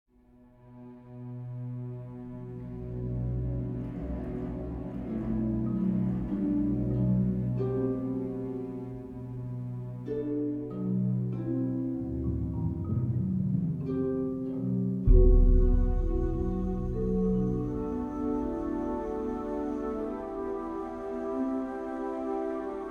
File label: Tension; Jurassic World Evolution 2; PS4, PS5, Windows, Xbox One, Xbox Series X/S